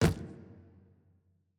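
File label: ambience room polygon punchbag training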